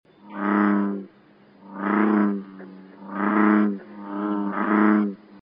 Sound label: bullfrogs